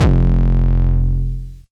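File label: 808 distorted, F1, kicks; Mbase 11 Kicks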